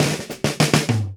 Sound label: Fills 103; Zero-G; Monster Beats